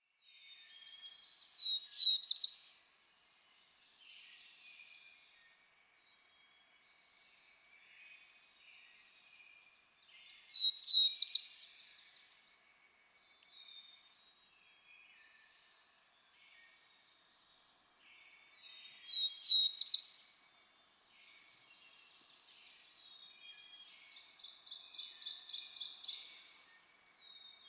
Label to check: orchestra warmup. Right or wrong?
Wrong